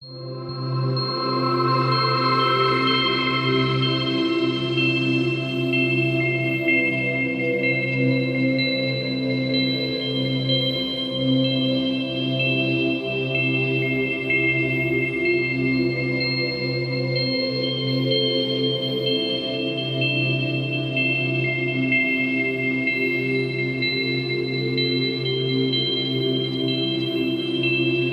soundscape tranquil dreamy light warm twinkly melody; sound design; zapsplat